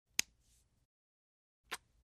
chmok

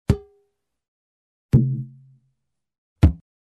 shlepok po vozdushnomu shariku

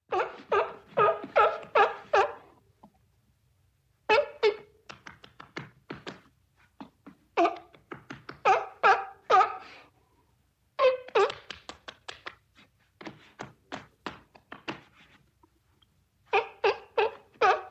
tjulen krichit i hlopaet lastami

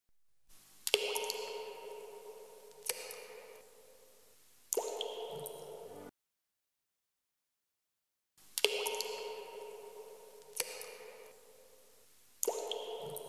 Падающие капли

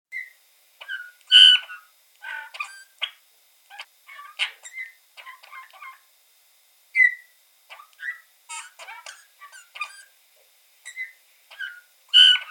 zvuki penija snegirja

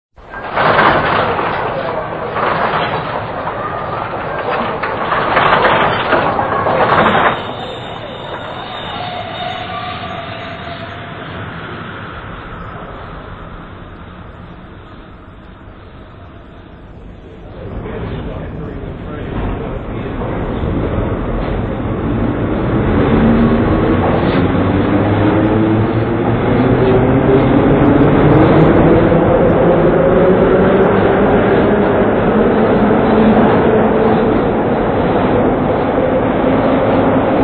shum metro

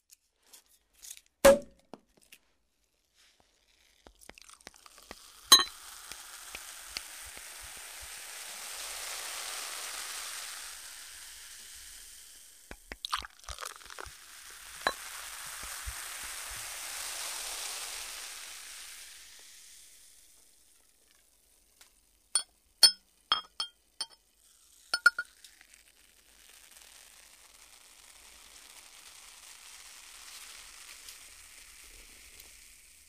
vskrytie probki i razlivanie po bokalam

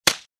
zvuk shlepka po licu

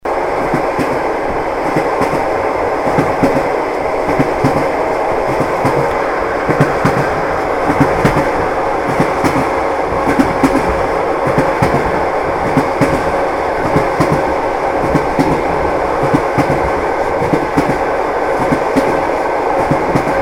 zvuk zheleznoj dorogi iz vagona

